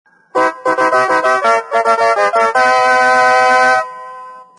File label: fanfare